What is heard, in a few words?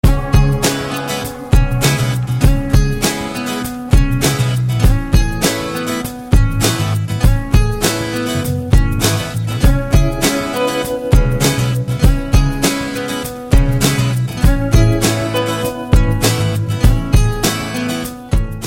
New Guitar